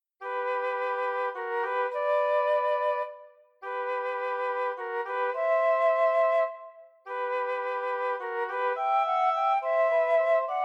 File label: Duo for Flute and Oboe